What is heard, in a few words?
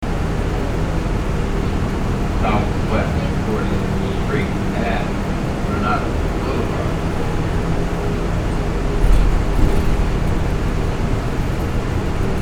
miami transit soundscape